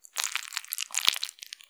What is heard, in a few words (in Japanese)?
膣内射精後 or 興奮時, 挿入 or 引き抜かれ終わる直前; ■挿入or引き抜く